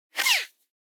Whoosh, Organic, Fabric, Strap, Zip, High, Stagger, Pushy; Airborne Sound; Organic Whooshes